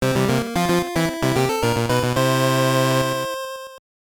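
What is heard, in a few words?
Jingle, Super Game Boy; Blades of Steel; GB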